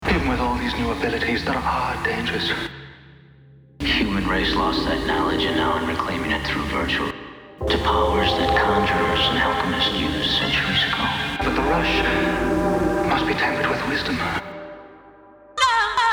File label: 90s garage